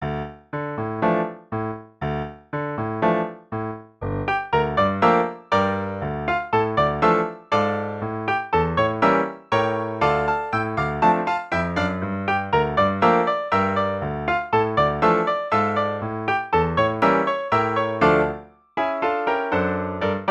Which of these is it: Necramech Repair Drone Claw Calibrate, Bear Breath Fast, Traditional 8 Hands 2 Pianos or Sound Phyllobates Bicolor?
Traditional 8 Hands 2 Pianos